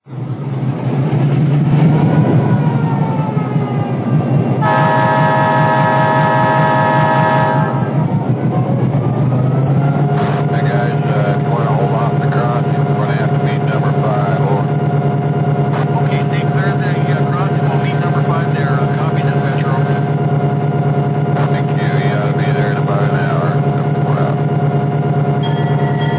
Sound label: SD24; EL